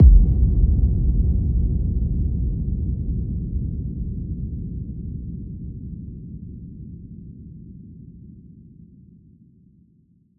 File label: electric explosion; Crash Bang Boom